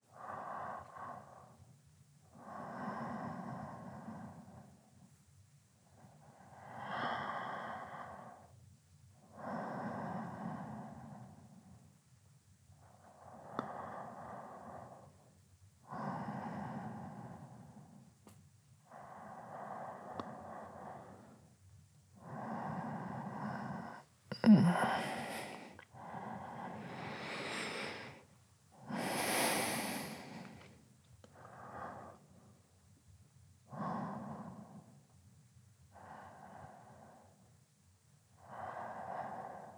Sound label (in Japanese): 寝息ボイス; 【濃密×囁き】車の中で密着えっち〜発情した彼と狭い車内で汗だくたっぷりキスハメ〜